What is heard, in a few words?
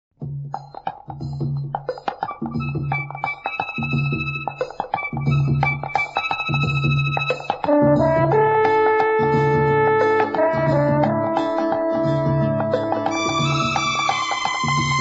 Instrumental